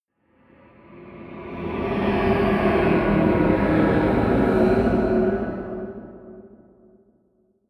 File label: omen choir transition low